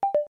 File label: Soft Notification